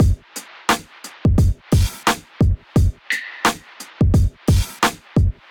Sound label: Peach Kit 87 bpm, Hip-Hop Drums; MIDI Clips; Golden Era; Sound Oracle